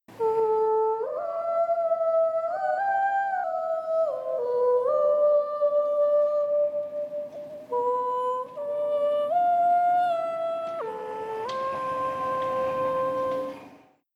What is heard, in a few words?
Tunnel Vox (Pitch Edit)